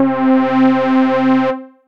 December Brass Pad; Instruments; Synth Pad; Ableton; Synth Essentials; Operator

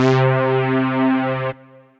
SynBrass; Synth Brass; Santa Monica SynBrass; Ableton; Factory Packs; Singularities

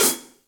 Hihat Pedal Low Loud Squashed, Drum Hits; Standard Hits; Ableton; Factory Packs; Session Drums Club

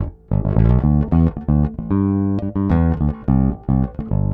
110 Old School Bass G, E-Bass; Pop Rock

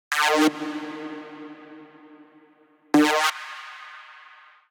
Bass D 170 bpm; Ableton; Factory Packs; Skitter and Step; Hit It

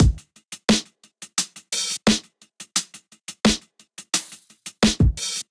Deep Space Kit 87 bpm, hip-hop drums; MIDI Clips; Golden Era; Sound Oracle

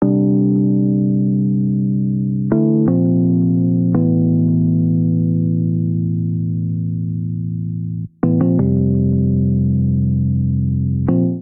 Dark Chord Piano C Major 84 bpm; MIDI Clips; Tonal; Accompaniment; Drive and Glow; Ableton; Factory Packs

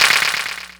Clapbox Pitch; Ableton Foundation FreePacks

